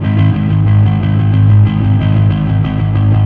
Fuzz G sharp 1

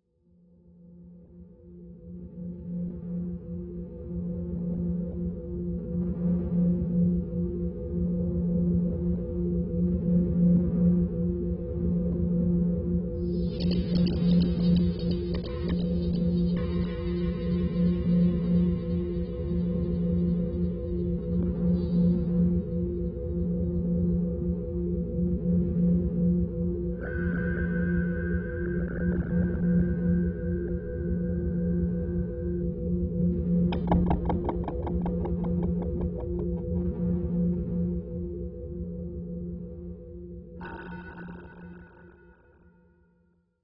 Spooky Sound; Silversword

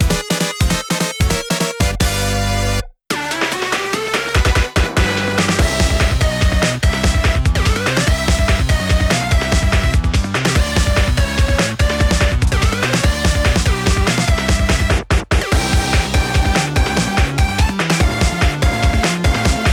Underwater (Hurry!); paper mario the thousand year door complete; Super Bowser Bros.

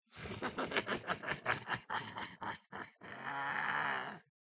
Spring laugh; gamerip; the joy of creation halloween

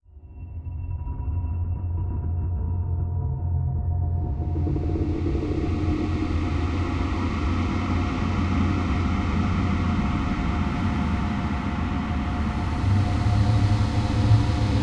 eerie giants; FlashbackHope2

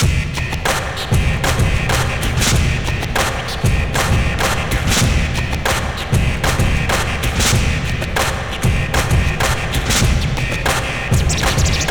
Beat; NBA Live 2003; PlayStation 2

